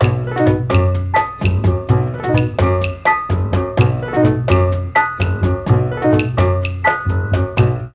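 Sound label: bass strings, Mellotron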